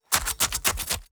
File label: stab punch hack